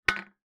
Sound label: stone down on metal drain cover; foley